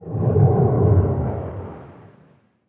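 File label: system shield rear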